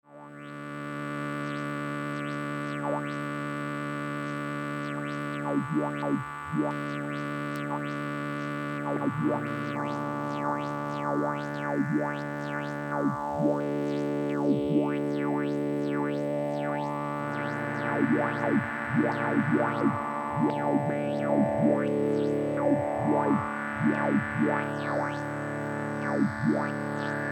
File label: resofilter atonal